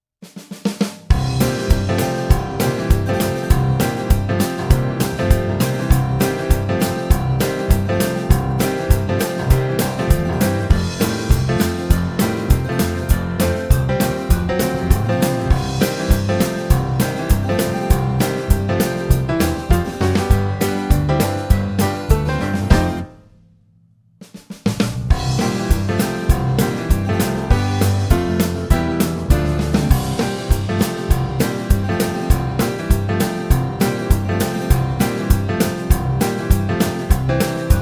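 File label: country blues c, banjo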